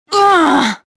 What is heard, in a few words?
gasp